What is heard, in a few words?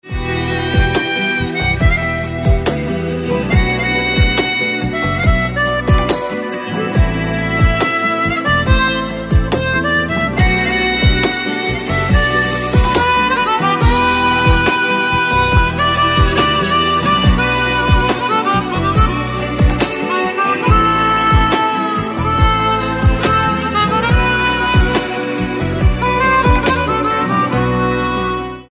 Mobile ringtone